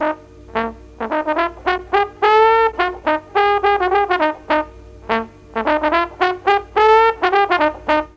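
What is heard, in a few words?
E4 D BONE, mellotron trombone; DIXIE+TRMBN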